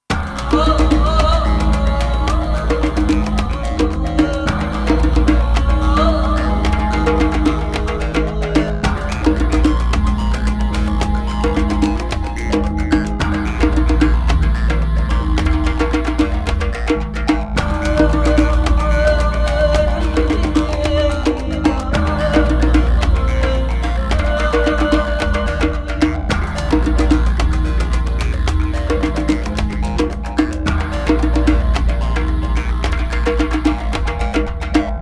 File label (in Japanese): 戦闘：作戦開始